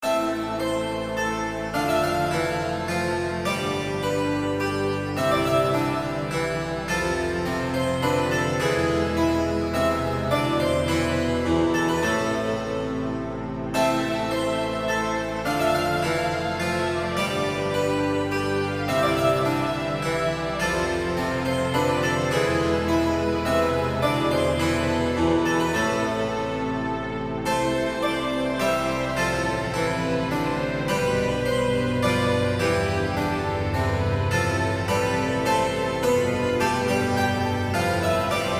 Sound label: menuet